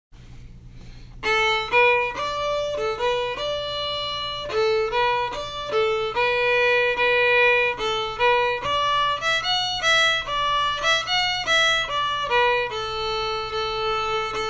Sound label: angeline slow